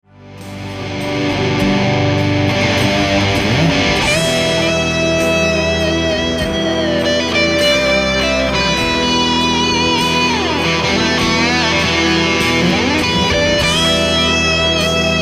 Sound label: solo